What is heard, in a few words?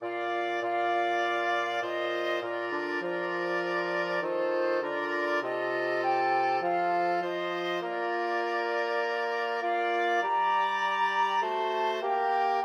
flexible mixed ensemble, 4 players